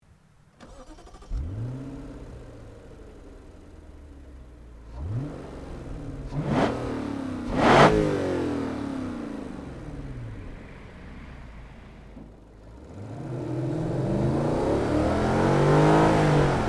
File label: Lexus LS460L